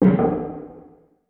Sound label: INDUSTRY; East West; Global Sounds